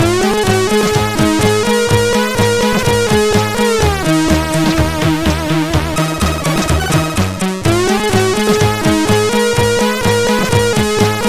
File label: Channel 1 5 intro, sid CSG8580R5